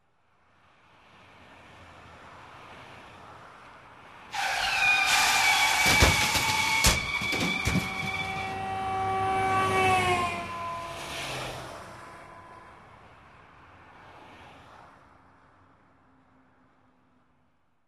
zvuk avtomobilnoj avarii na doroge

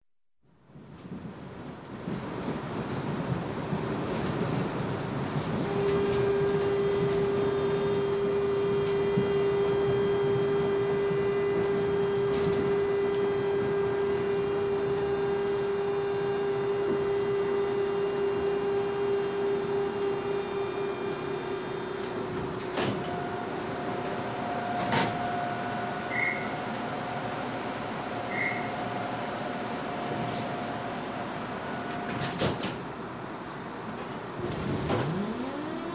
v vagone metro

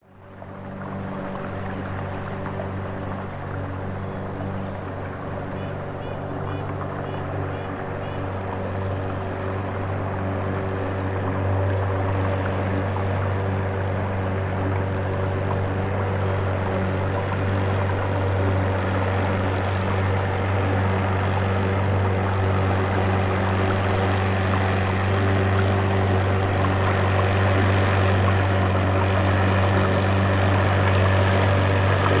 motornaya lodka proplivaet mimo medlenno

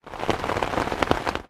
kapli morosyashego dozhdya popadayushie na zontik

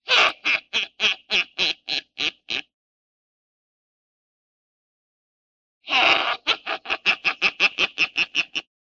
korotkij smeh vedmi

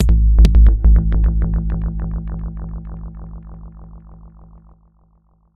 kik rezonansnij mehanicheskij reverberator

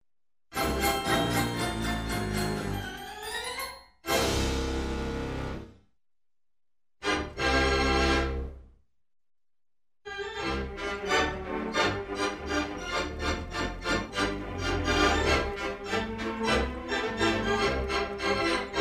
zvuk organa cirkovie melodii

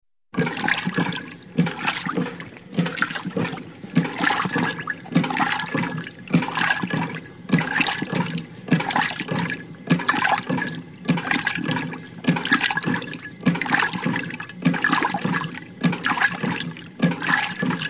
aktivatornaya stiralnaya mashina

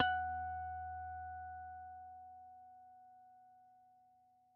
chistie garmoniki elektrogitar

